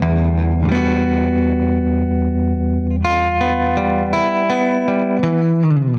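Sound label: Baritone Guitar, 80bpm, E; Loops n Lines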